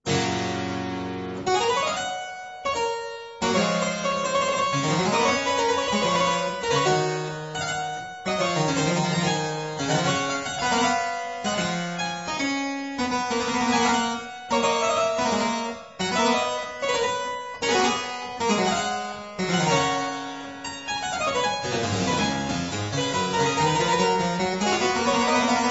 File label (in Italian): Ouverture a 1 Clav.; Variatio 16